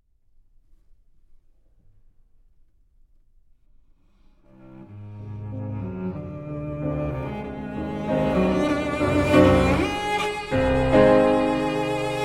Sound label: Cello Sonata, Allegro Ma Non Troppo